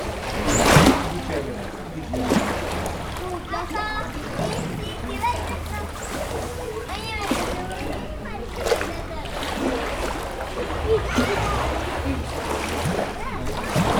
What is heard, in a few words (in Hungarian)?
vízi életmód, nílusi víziló; pecsi zoo 2017